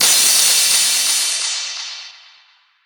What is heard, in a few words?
Crash, Cymbals; Vengeance Samples